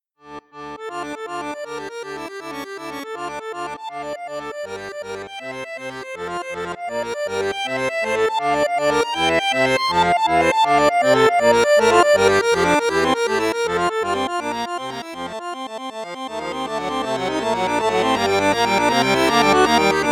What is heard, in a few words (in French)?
registre basson piccolo, accordeon; saltarelle